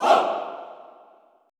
ALL HOO, SHOUTS, Classical Choir; Best Service; Extended Classical Choir

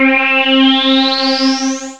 RESO SYNTH, SYNTH FUNK; Zero-G; Funky Elements

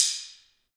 ambient stix, Afro wood

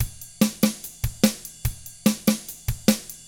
drums, 50s rock; ILIO; Double Platinum Drums 1

